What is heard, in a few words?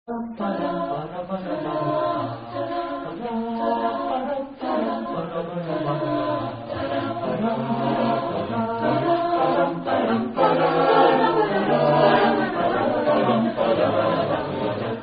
drinking song; MIT Engineer's Drinking Song; We Are The Engineers